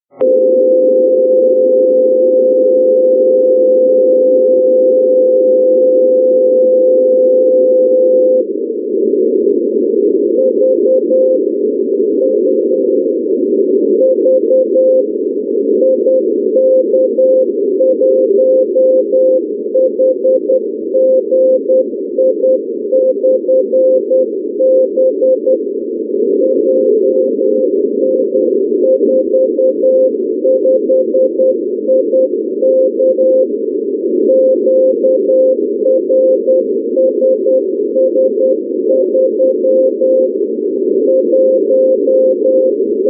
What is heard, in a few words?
beacon; ik1hgi